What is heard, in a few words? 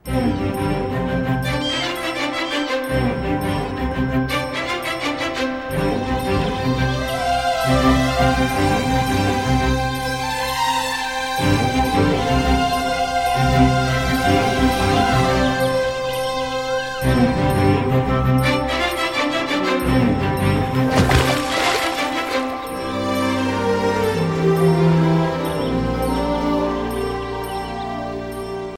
Village Bgm